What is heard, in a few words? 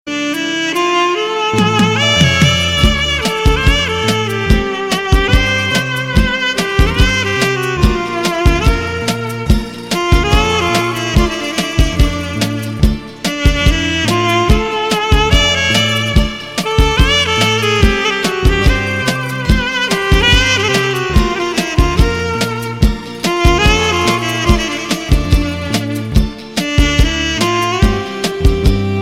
classical ring tone